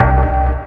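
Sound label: chord, Stabs; E Lab; XTc Files of House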